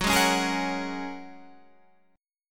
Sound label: Bb Minor 7th Sharp 5th, guitar